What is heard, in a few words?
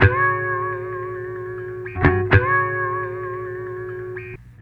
Bass